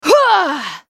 spellcast effort; Akali

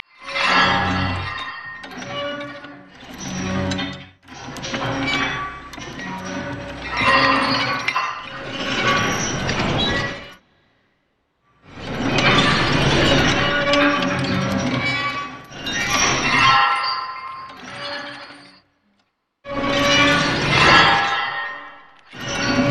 chains wind strong

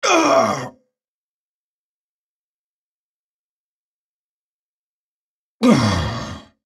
dying

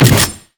spray and pray; LoL; gangster twitch

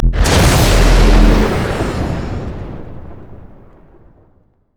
passive melee; LoL; jayce

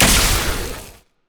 spider attack; LoL

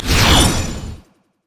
shield; rumble skin03

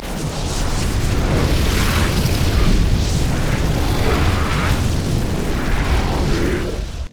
scorched earth; LoL; shyvana

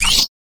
heat seeking missile lock; urgot; LoL

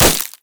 monkeyking basic attack; LoL

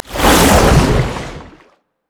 launch; LoL; syndra

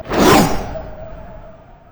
basic attack; Yasuo

